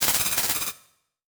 Production Element Title Transition Data Transmission Communication